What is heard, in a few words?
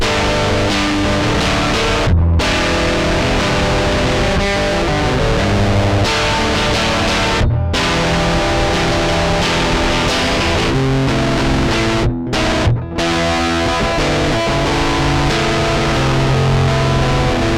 strumming; tl distraptor; tonelib